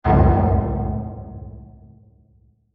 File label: Diffuse Impact Blast; Blasts; Impacts; Crafted Signals